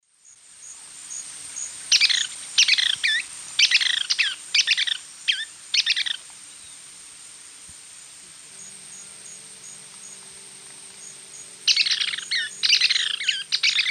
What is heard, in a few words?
Stigmatura napensis, calls; S. America; Eastern Lowlands